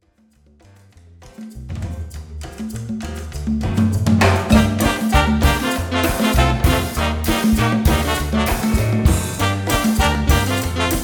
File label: Cumbia